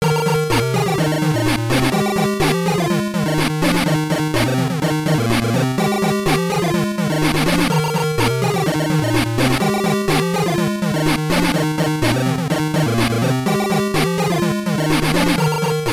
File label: Victory Fanfare; fantastic dizzy; master system